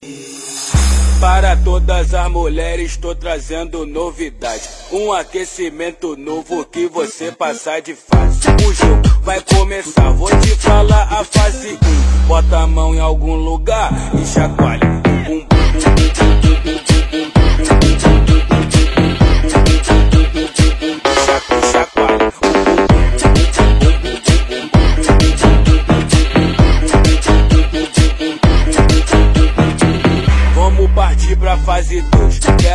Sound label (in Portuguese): Paredao Treme Treme Mega Funk